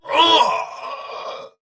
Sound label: Death; Warframe; Dax Ashigaru; Battle Barks